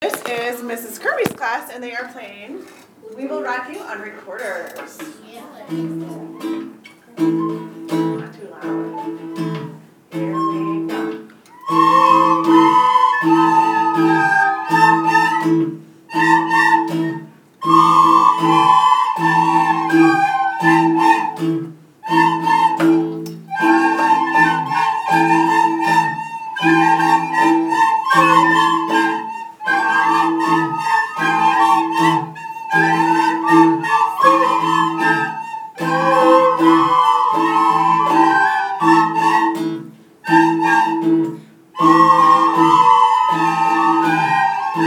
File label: recorder; kirby